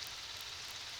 Background Noise

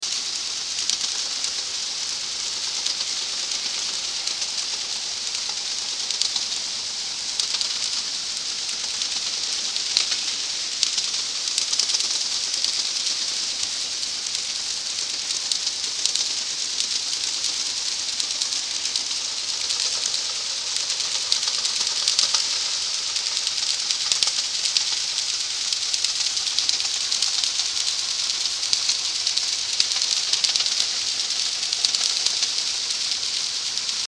Freight

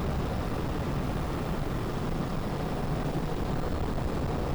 clean vessel noise

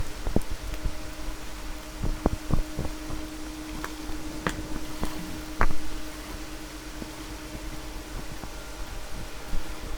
No Ship, helicopter

